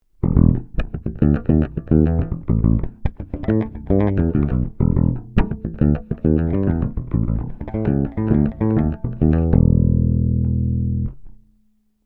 Ibanez EHB1505 Bridge Passive

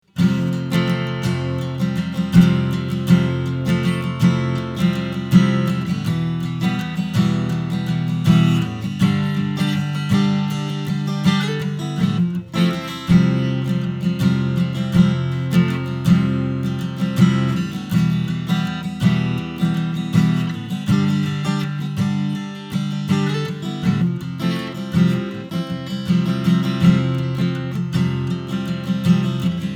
Framus Legacy Series FD 14 SV Strumming Pattern

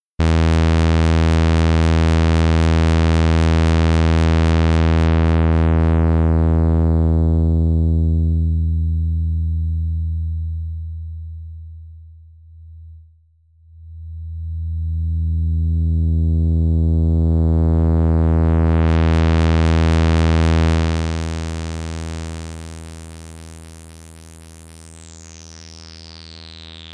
Filter SAW Res0 Res75 Res100; iVCS3

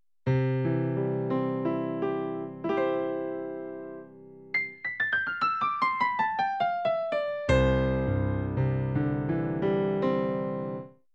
microSTATION Pianos

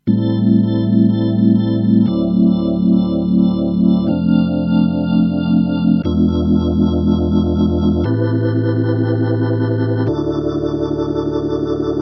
E Organ Neo Ventilator II

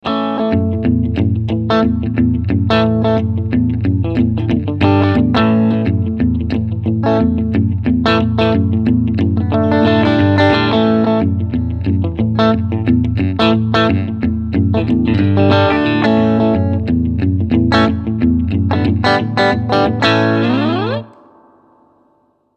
Clean Picking Tube Amp

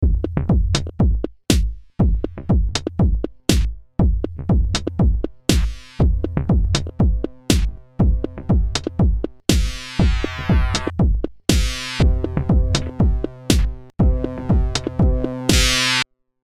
beatrepeat

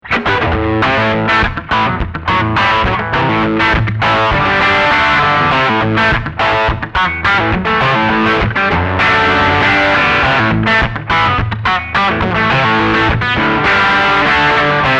Heavy Crunch

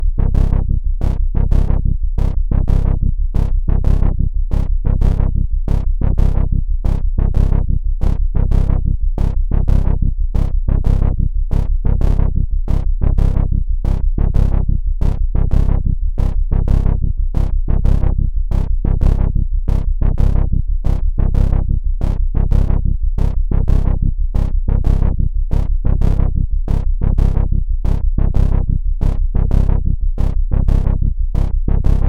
Noise Seq; XILS3

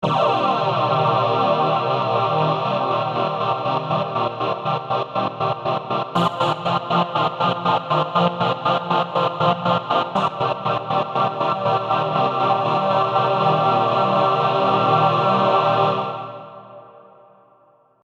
Tremolo

